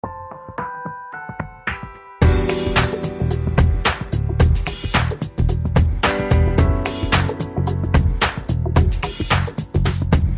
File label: rhythm blues